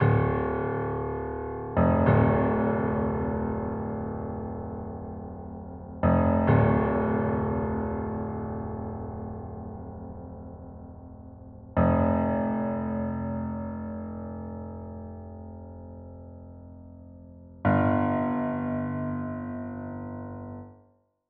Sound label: Piano